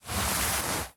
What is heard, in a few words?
Drag Body